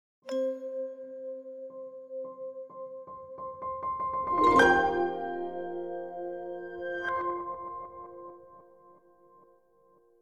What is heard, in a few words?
Drops Of Light no sub